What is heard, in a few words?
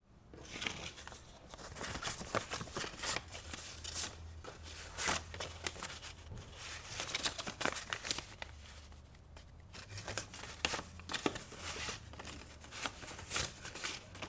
Counting Money Handling Us Dollars Bills; Edlarez Vsnr